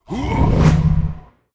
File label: ghost captain death